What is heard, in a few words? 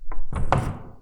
Furniture